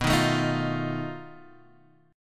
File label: B 7th Flat 5th B Guitar Half Step Down; B7b5